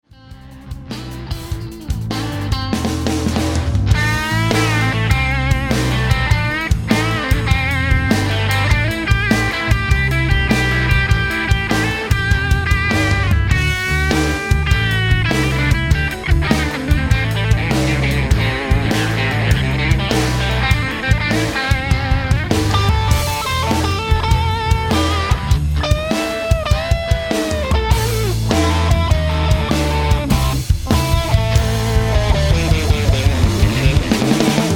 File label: guitar solo